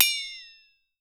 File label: FLEX-A-TO, FLEX-A-TONE; Voice Crystal - Steve Reid Definitive Percussion